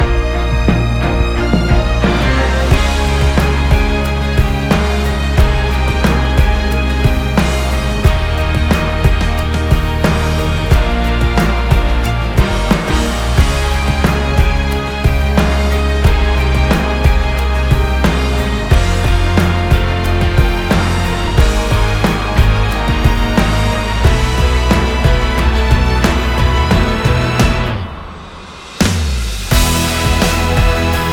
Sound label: Accompaniment; Descendants